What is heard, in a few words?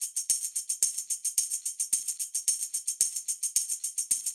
Tamb Proc 110, funk soul Beats; sampled funk soul